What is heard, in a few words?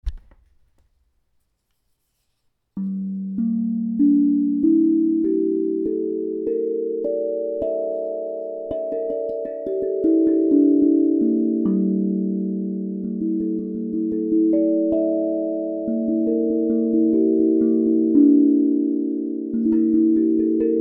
Gm7 9 notes 432hz